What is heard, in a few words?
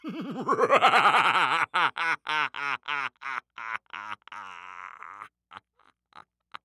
Horror Scary Human Male Evil Laugh